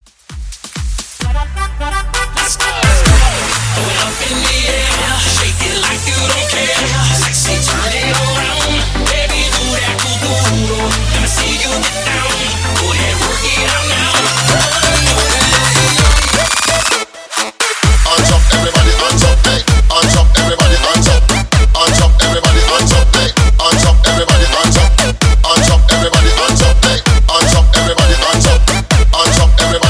Tribal Dutch ReBuild Hype 130 BPM

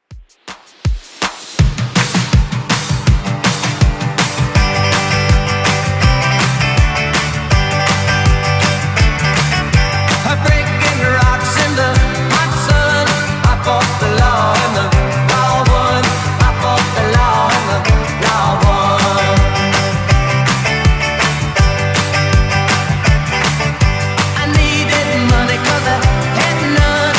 60s Redrum 162bpm CLEAN

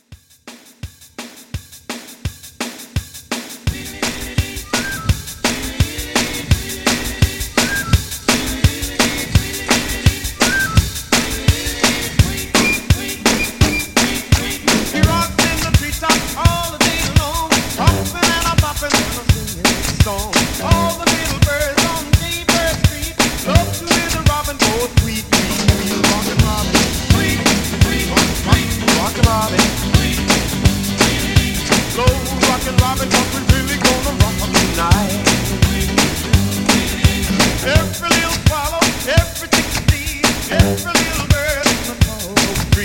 50s Rock N Roll Re-Drum 169

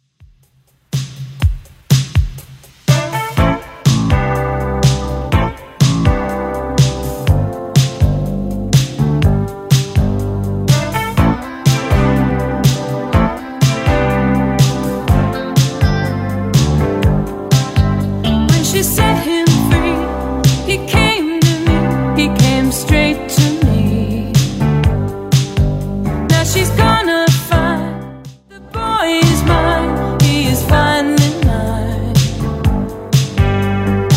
80s Redrum, 123 bpm

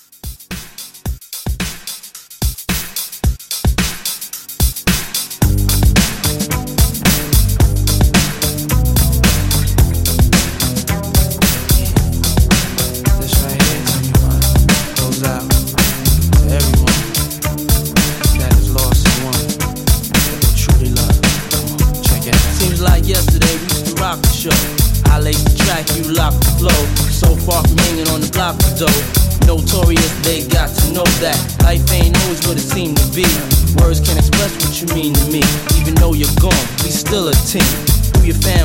90s 110

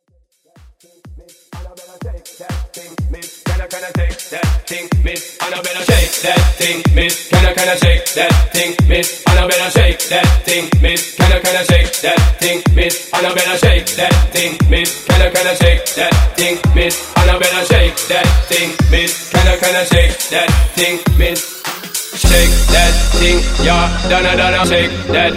Transition

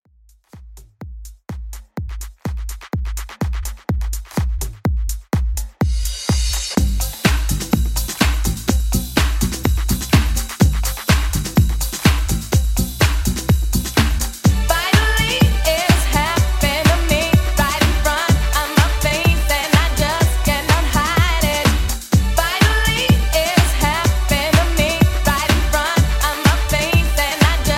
90s Classic Redrum 125 Bpm; djyan

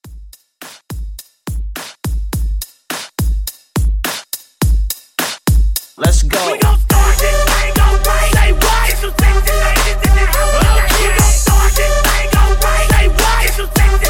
Hype Re Drum 105

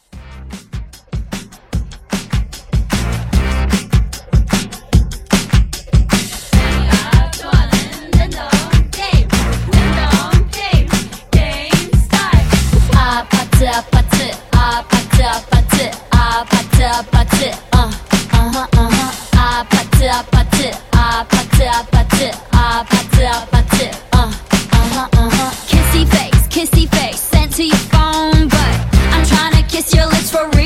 Pop Rework; Remixfreakz

